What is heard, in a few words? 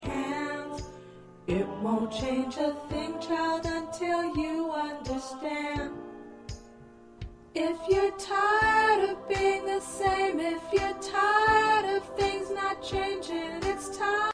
Alto